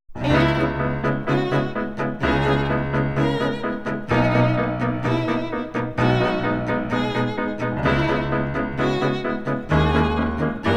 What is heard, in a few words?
Piano Trio